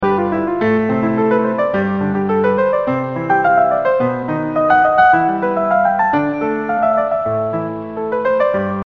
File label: Piano Voice